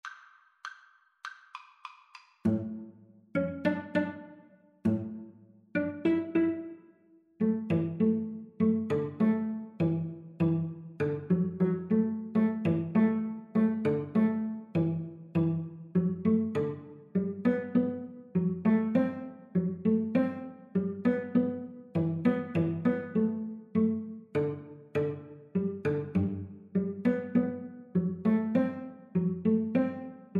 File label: pizzicato polka, violin cello duet